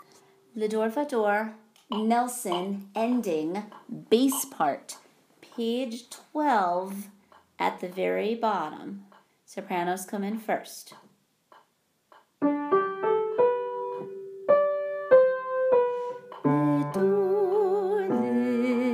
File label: Ending Bass